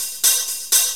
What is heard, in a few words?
125 Loop